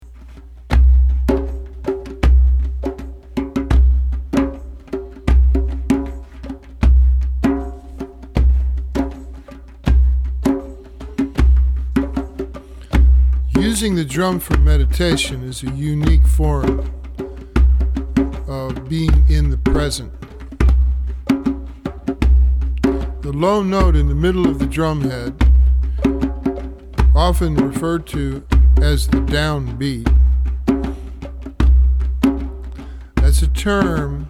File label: drumming meditation; Drumming Meditation Introduction; drumming meditation for beginners